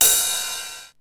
RIDE, Classic drum machines; 909; musicradar-synth-samples

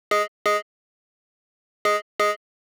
BlipSynth DRY 138BPM, G#minor; Melody & Synth; Vengeance Samples; Manhattan Kit

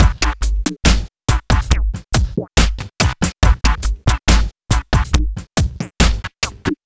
Slomo Beat 140 BPM

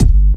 Bassdrums

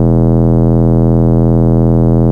Root E, Bass

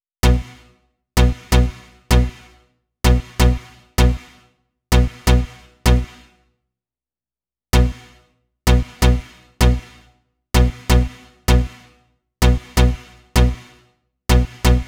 Club Tone, 128 BPM; Rap; Vengeance Samples; Vocal-Hook Kits; Song Kit

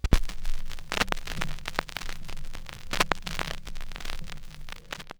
Record Noise, Vinyl Record; Record Noises

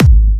Bassdrums UK Trance Kicks

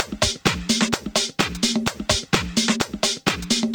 House Loop, 128 BPM; Vengeance Samples

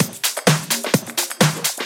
Percussive Loops 128BPM; Vengeance Samples

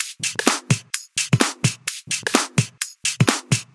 Minimal Loops

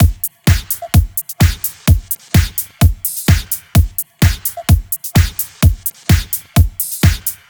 128BPM Kit; Vengeance Samples; Timebomb Kit

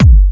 Bassdrum; Vengeance Samples

